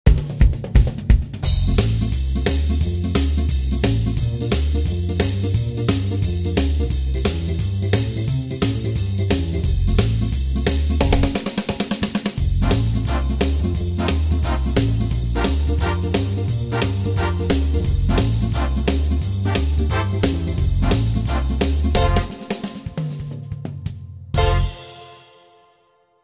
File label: Real Jive; wersi